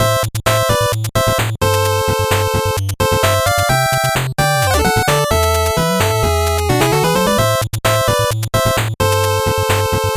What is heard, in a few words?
8bit bgm slow